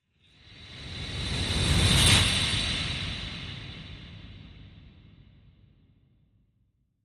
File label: Sleighbell Whoosh